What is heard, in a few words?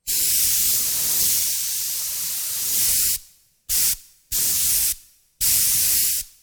Leaking Air pump